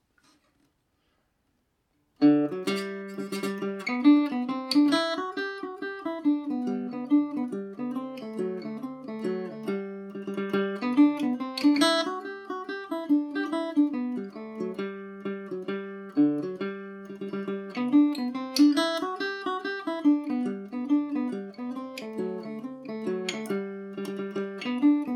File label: hornpipe gmaj, mandolin; The Worcester Hornpipe